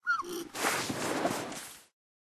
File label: Penguin Die; pinguin